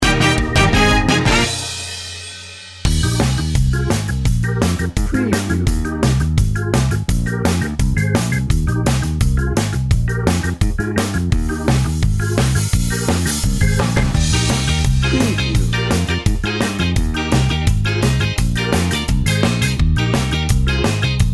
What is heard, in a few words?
ska party bed